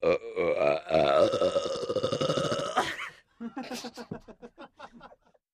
Lng Drawn Out Belch

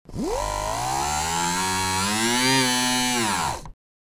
Mechanism Buzzy Rev, servos

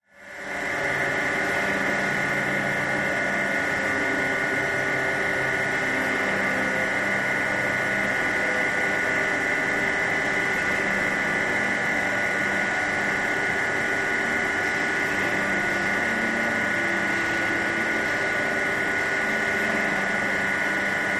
Industrial Amb Factory

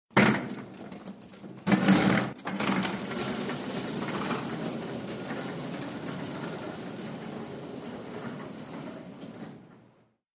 Gurney Cart Awy Fast, gurneys

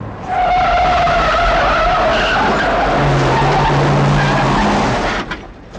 Car Chase Turn Skid

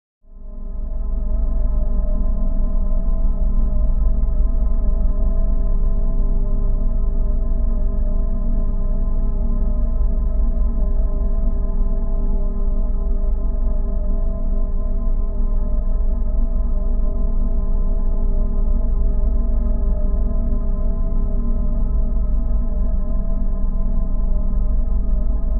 Bed Metallic Drone Hi

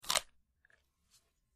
Bite Vegetable; punches kicks hits bones

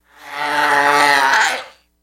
Camel Clearing Throa; camels

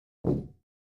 Free Weights Exerci

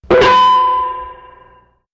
Ding Metallic Hit, chimes & bells

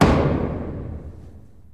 Gavel Impact On Wood

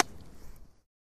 Paddle Ball Hit, Exterior Ambience

